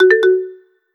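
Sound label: Soft Alert, ringeklokke; Ringeklokke med høy kvalitet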